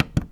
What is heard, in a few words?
button normal